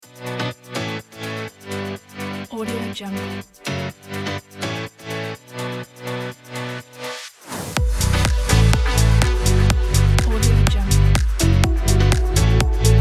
energetic house logo

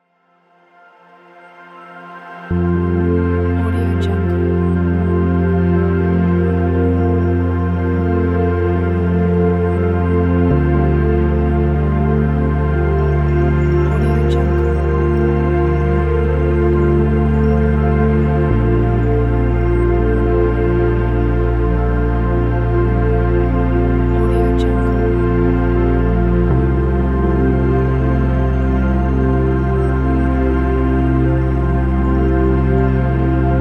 inspiring soundscape; soul prod

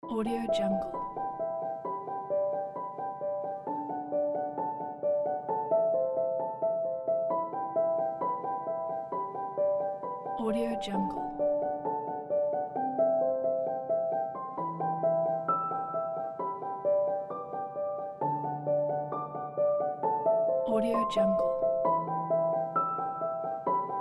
the sad documentary piano; plaincask

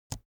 office keyboard laptop space release soft; foley; smartsoundfx

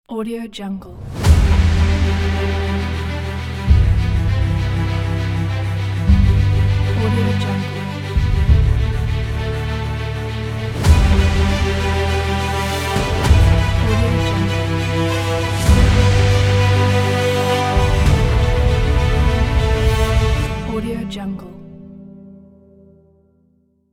epic cinematic trailer ident

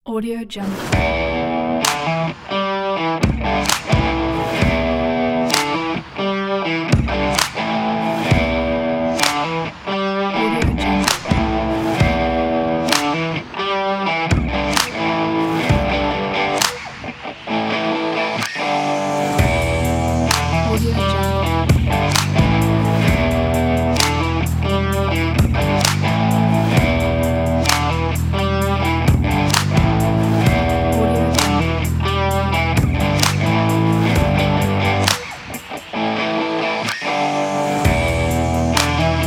cinematic stomp rock

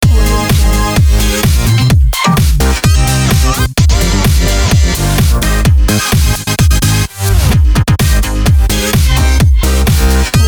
dance loop

